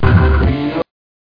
brick

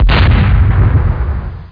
TANK BOOM; ComanGld

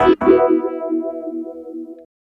Guitar Lick; Loops

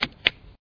toggle; Anchorman Press Kit

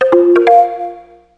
Connect

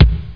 Heartbeat; Troubled Souls

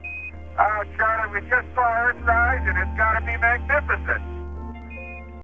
Mail

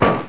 Hit; SpaceInvasion

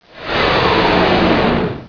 destroy; magic; duelsounds

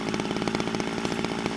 saw loop, grinder; PC Gamer IT CD 45; SMANDEMO; baddys

